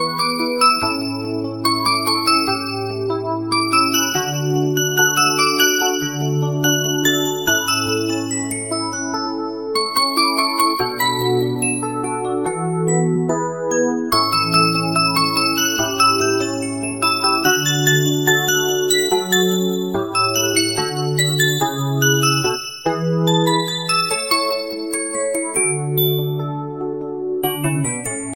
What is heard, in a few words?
Cute bells